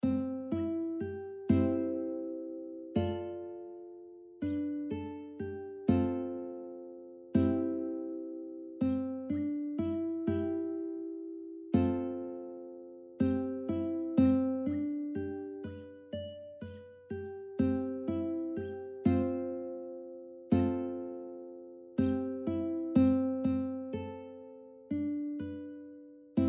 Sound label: Ukulele; Morning Has Broken; Child in a Manger